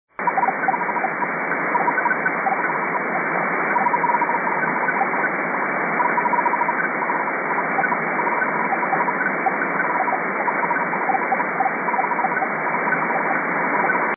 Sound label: 4870 kHz unid mode; Unid on 4325 and 4870 kHz; N&O 199